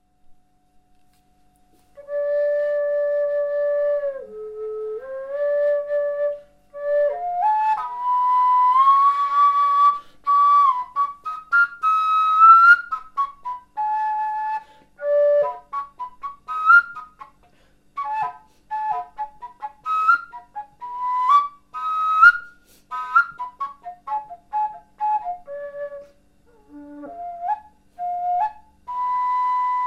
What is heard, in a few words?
flute